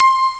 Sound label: ding, Amiga Soundtracker; Amiga Soundtracker Sample Packs